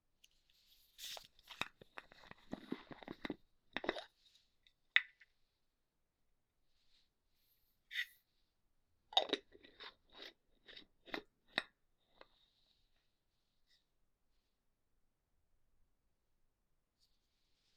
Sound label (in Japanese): マッサージ(馬油), ASMR